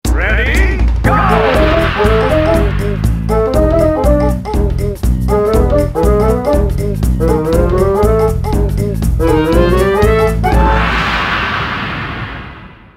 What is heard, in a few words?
Konga Beat